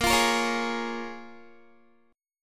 A sharp Minor 7th A sharp Guitar, chord; A#m7; strummed; 18,20,20,18,20,x

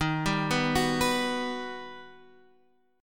G Augmented Major 7th

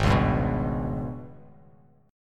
G sharp Minor Major 7th Double Flat 5th; strummed; JGuitar

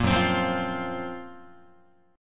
F sharp Major Sharp 11th F sharp Guitar; F#M#11 Chord; strummed; 1,2,3,3,4,4

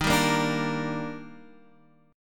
D sharp Major 7th, Guitar Drop D

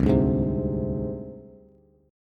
C Suspended 2nd Sharp 5th C Bass; Csus2#5 strummed; JGuitar

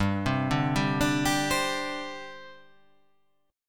G Suspended 4th G down; arpeggiated; JGuitar

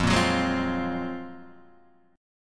F sharp Major Sharp 11th F

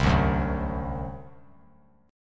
C Suspended 2nd Flat 5th C down; Csus2b5 strummed

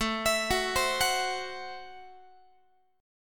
A Minor 6th A Guitar; arpeggiated; Am6

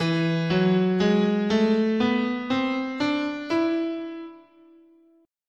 E Fifth Mode, scale

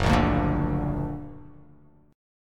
Gb Augmented; 2,1,0,3,2,x,x,x; Gb+ strummed

